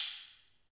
ambient stix, Afro wood; Roland LCDP14 Africa VOL-2

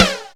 Flange Snares; Best Service ProSamples vol.37; Dance Synths; Percussion

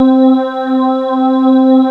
WS DIGIPIANO; CMP - Analogon

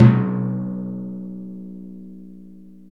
PRC TIMPAN, Timpani Dry; Roland LCDP03 Orchestral Perc

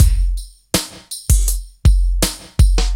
breaks 162

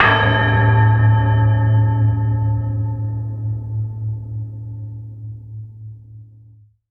prc a1, MIRAGE Bell + Flute

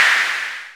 PRC CLAP, Analog Drums; Roland L-CD701

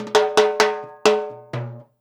FILL, DANZON, Percussion; InVision Interactive; Percussion Slam

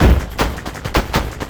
LOOP, 160 BPM, Drum & Bass; Ueberschall; Drum & Bass Flash Trackz

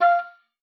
Oboe, F5; Xsample VOL-6

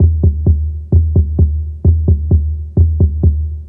Dark Beat, Dark Organic Processing; Sonic Foundry (Sony Creative Software); Loop Noir

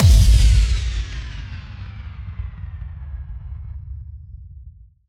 Impact; impact samples

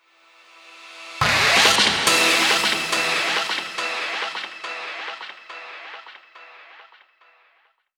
Special Sounds; Vengeance - Essential Clubsounds Vol.2

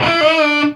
CLICHE L, HEAVY METAL; Greytsounds

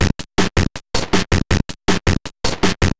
Noise Beat; Drums & Drones

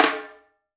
FILL, Percussion; InVision Interactive; Percussion Slam; PROGFROG

